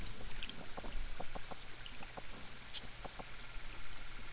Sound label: clicks, fish grunts; cusk eel like; boat